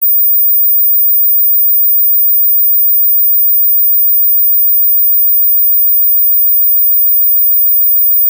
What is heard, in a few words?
mosquito ringtone